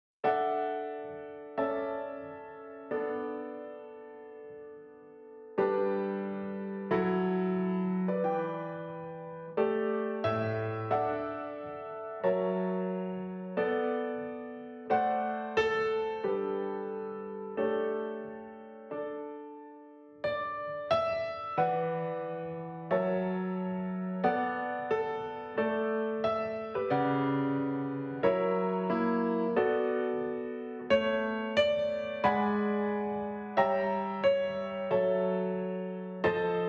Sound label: inst